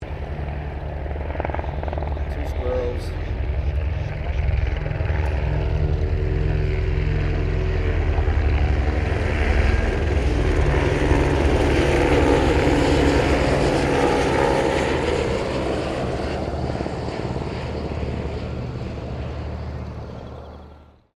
HELICOPTER AS-350BA SQUIRREL PAIR PASS; RAN Squirrel Helicopter; Helicopters (Sound Effects:Vehicles)

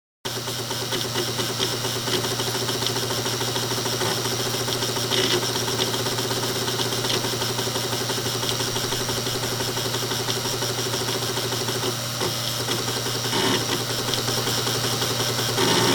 Computer hard drive whirring and grinding noise; Computers; Electronics

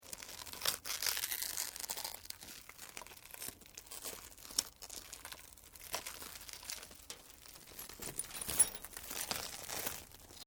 GLASS LAMINATED TWISTING; Material components for constructing more complex sounds; Glass (Sound Effects:Materials)

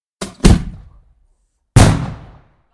Fireworks, Ground Mine; Backgrounds; BBC

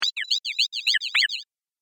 Chirpy Beep Sound Element; Beep Sound Effects

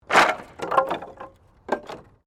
Wood planks debris; Materials

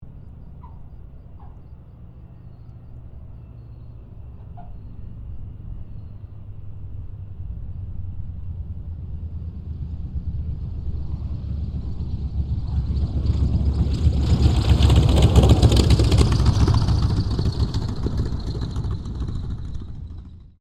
AIRCRAFT T-28D TROJAN LAND; Airplanes; Sound Effects:Vehicles

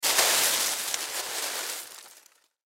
Leaves Movement, Leaves Movement Foliage; Forests And Jungle Sound Effects; Backgrounds; Foley; SoundEffects-Blastwave

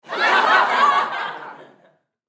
Human Crowd Studio; Crowd Reactions Laughter Loud Short; Blastwave

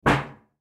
Sheet Metal Buckle, Sheet Metal Buckle Dent; Materials; Foley; Blastwave

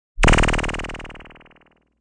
Squiggle; Squiggles

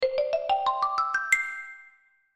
Marimba Arpeggio, Marimba Arpeggio Ascend High; Mallets; Blastwave

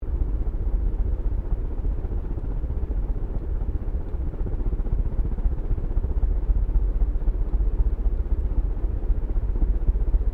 FAN CEILING HIGH SPEED MIC BELOW LOOP; Various fans; Fans (Sound Effects:Electronics)

